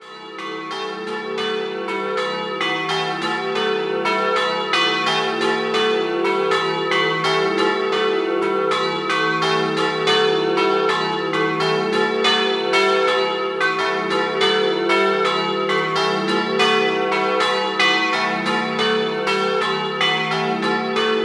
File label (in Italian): FLAMBRUZZO, campane